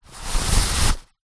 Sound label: household toilet roll paper pull turn; zapsplat